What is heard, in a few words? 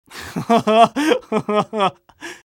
laugh evil; garrysmod; tf2-content-gmodcontent